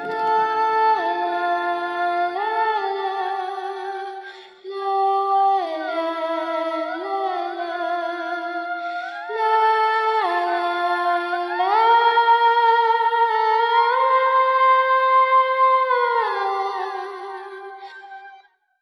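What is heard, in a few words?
voice wistful woman; Sad; Loops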